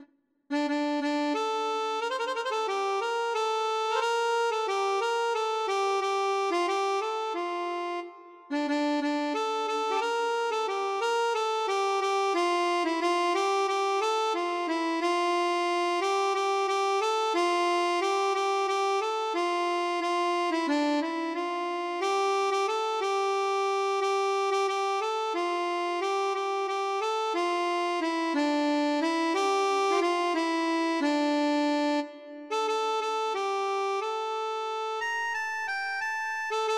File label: harmonica